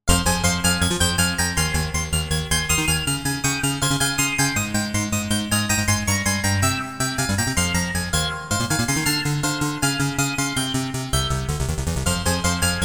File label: synth 8 bits, roland jv880